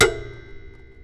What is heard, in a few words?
Raw, Wire & Tin, contact mic